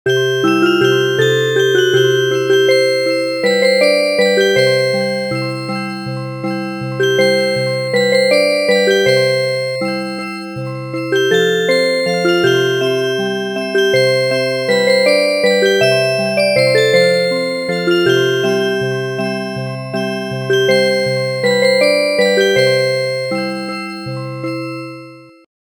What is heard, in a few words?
Music Box, traditional